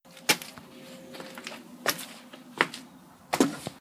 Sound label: walk stone